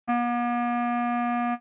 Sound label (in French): clarinette Do3